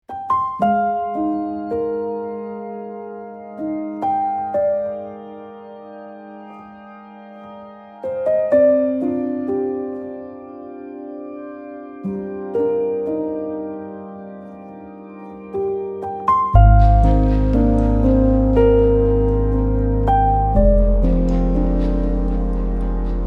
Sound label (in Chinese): 钢琴; Komplete